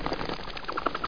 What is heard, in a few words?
bats; dosgames; roc